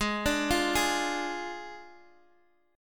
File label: D Diminished G sharp